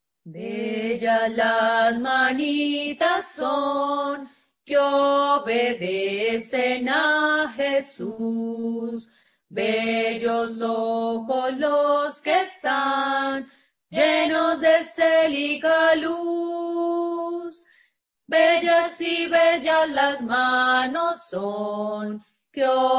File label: Spanish